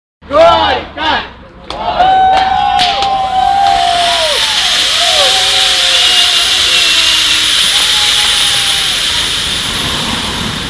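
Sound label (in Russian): kriki gorko i svadebnyj saljut, wedding shouts and fireworks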